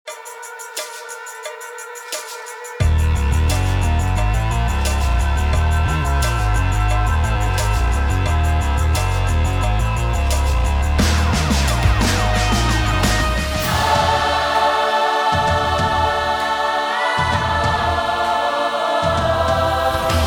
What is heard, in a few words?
Sports Sampler; EMM110 Sports: All or Nothing Sampler